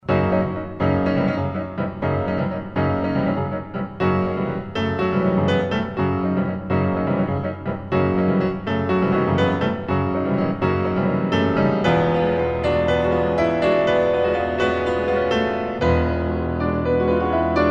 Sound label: piano; Alma Brasileira